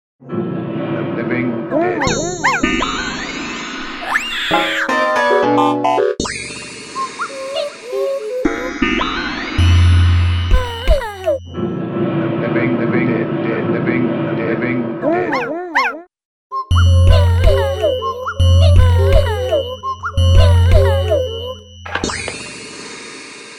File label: Mixed Kit